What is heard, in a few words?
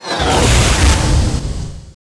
Attack; Draco; Mob